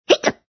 HUMAN HICCUP

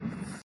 cutting cutting

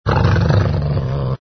ANIMAL DOG

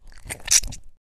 wet fart

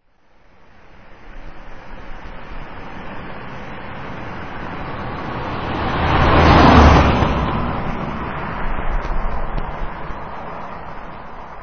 highway

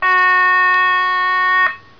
nautical

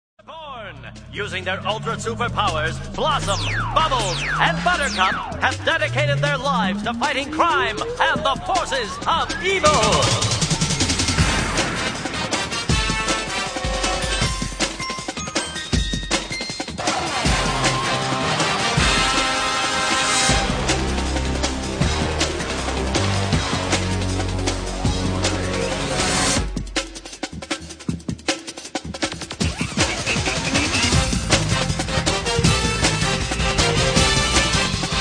THEME SONG

